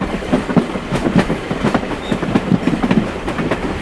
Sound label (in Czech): vagonky; Mafie 1